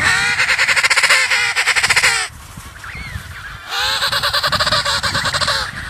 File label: Penguin; Little Zoo